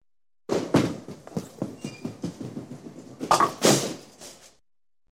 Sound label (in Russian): bouling brosok shara mimo